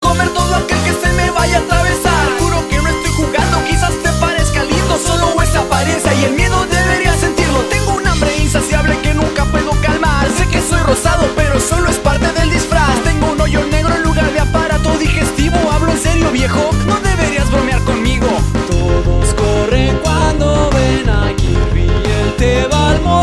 rap; Kirby

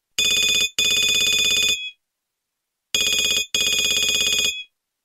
Fire alarm Nokia

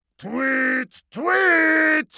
Heavy Bird

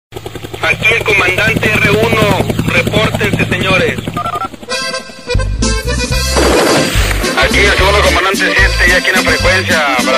Clave

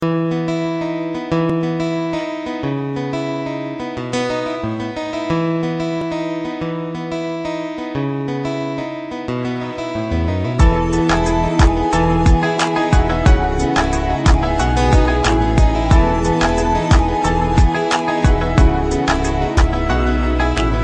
Romantic; Dabang2

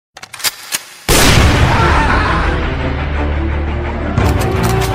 Shotgun Scream

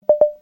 Simply Best Beep

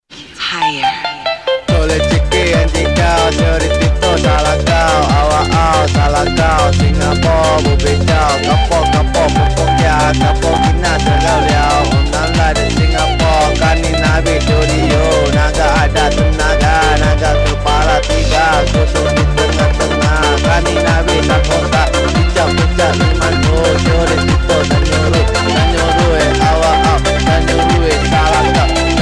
Techno

